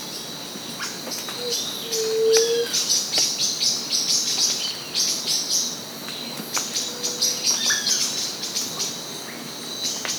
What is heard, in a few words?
White tipped dove, قمری ورو